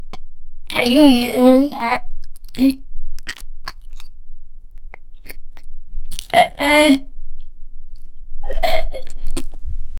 obese woman binge eating

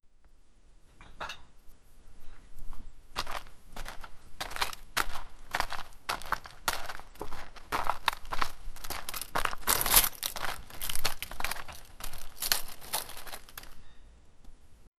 harry potter walks, footsteps

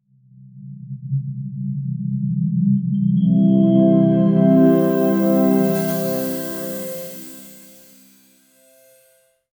cinematic startup tone

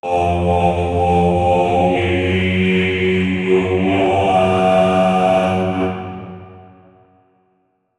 angel voice cinematic; angelic

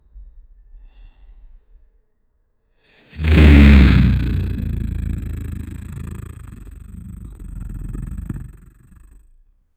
a dragon collapsing, body fall

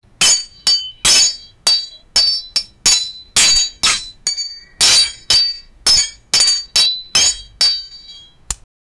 the clanging sound of swords clashing in a battle

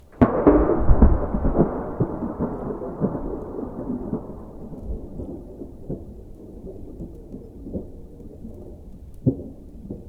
sound of a huge waterdrop, storm

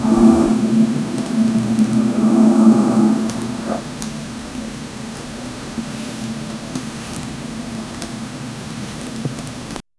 soft sticky footsteps; texture

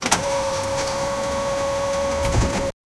women with back pain

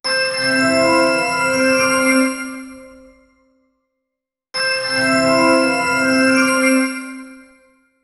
soft reward win sound

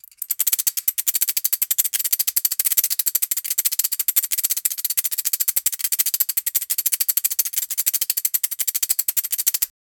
fast rhythm ting ting, blacksmith

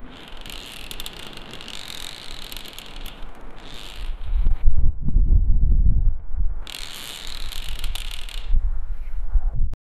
empty playground strong w

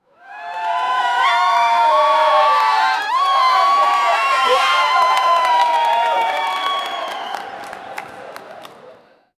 high school hockey arena

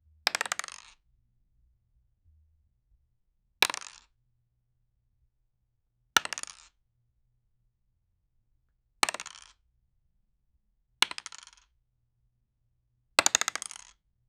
card game card drop on

